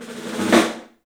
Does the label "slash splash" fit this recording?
No